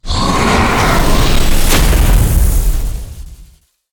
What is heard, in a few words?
medusa attack